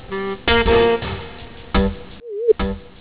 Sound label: funk